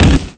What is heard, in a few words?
body fall wood; Spearhead Demo; MacFormat 2003 June